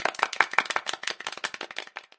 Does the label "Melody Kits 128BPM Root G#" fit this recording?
No